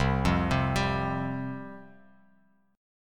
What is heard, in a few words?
C Suspended 4th C, Bass Standard; Csus4 arpeggiated; 8,8,10,12; JGuitar